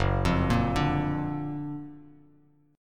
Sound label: F sharp Augmented Major 7th F sharp Bass Standard; arpeggiated